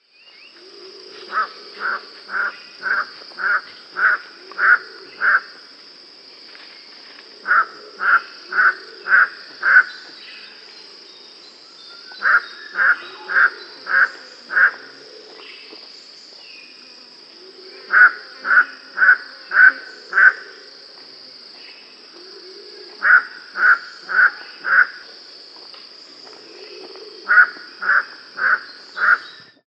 Andaman Crake Rallina canningi, andaman crake call